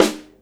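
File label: snare drum, Drums Acoustic; USB Soundscan vol.10; AKAI; 90s sample CD; DYNAKIT 3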